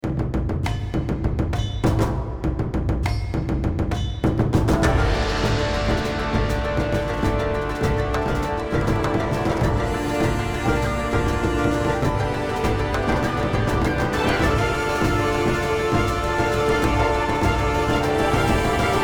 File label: Combat